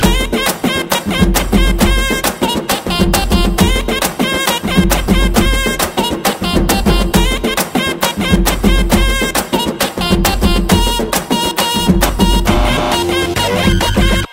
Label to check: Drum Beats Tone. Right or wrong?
Right